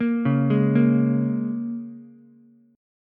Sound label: A sharp Minor 7th A sharp Ukulele, chord; arpeggiated